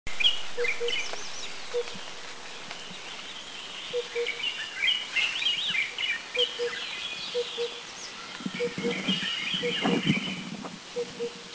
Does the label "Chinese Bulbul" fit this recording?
Yes